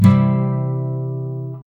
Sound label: create a warm acoustic gu; instrument